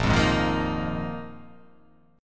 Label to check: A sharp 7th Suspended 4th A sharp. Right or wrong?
Right